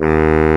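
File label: saxophone D#1; AKAI S-Series CD-ROM Sound Library VOL-8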